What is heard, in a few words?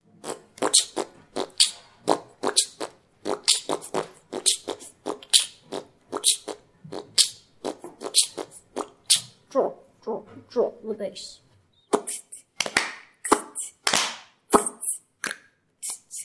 beatboxing with year 6, Year 6 have been learning how to imitate drum sounds with their voices